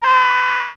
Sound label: Screaming, Yamaha DD-35